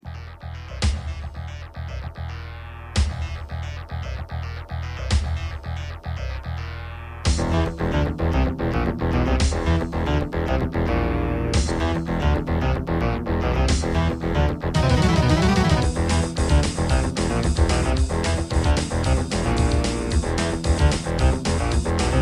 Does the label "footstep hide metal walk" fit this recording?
No